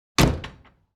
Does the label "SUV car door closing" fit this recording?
Yes